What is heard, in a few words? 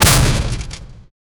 heavy metal impact bullet, armor